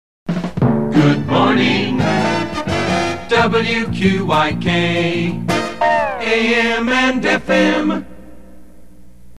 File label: jingle Good Morning; WQYK